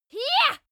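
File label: female attack ya soft, screams; schreie; menschen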